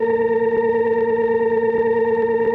ORCHESTRON A#2; Sonic Reality; Vintage Timetraveler

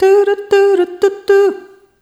Rhythmic G

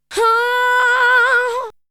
Vocal; ZERO G - Datafile DISC 1

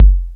kick, Big Beat Samples; Big Beat Samples & More; AKAI

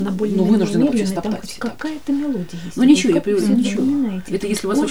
NOISE, CLASSIC VOX; Best Service; Hallelujah

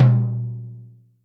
Jazz Lo, Acoustic Tom

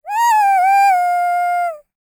LICKS, Vocal Planet Jazz FX; Spectrasonics

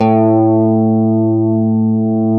GTR CH STRT, Clean E.Gtr, Ultra Sheen; Roland L-CD701; Clean E.Gtr4

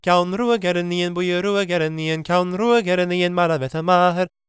GAELIC, World Voices; ILIO; Vocal Planet

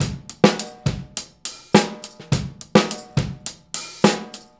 Straight Kit, World Pop Beats

